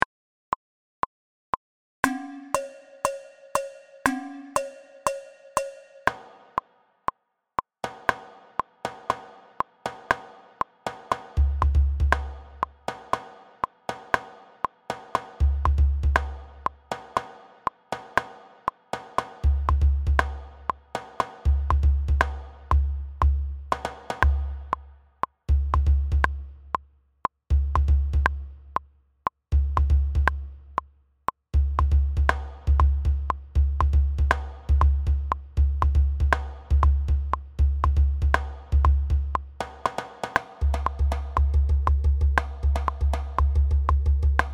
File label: djembes; Prépa 2 Djembé Vx 3